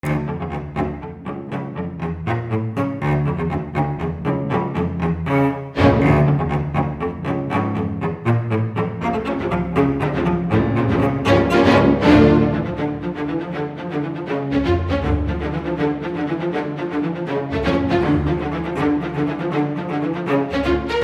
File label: aggressive short notes